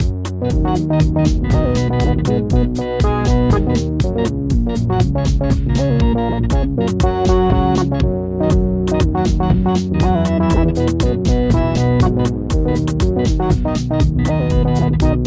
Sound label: loop